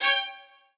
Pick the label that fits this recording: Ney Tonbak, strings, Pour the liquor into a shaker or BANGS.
strings